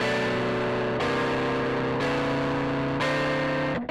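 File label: wet guitar chords, Multiband neural audio effect; Demo without amplifier; Wet-NoAmp; prism distortion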